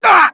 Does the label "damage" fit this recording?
Yes